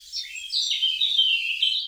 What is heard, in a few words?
bird; gmod; garrysmod; airfield